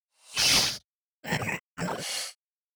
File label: lizard elder bored; LoL; monsters